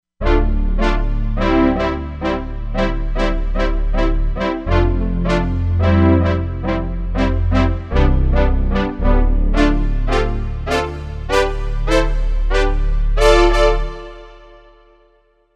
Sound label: Sweet Stabs, Korg MSC-07S Synth; Korg MSC-07S Synth 2